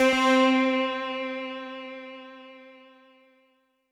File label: Synth C, Synth Prophet One Shots; Electronisounds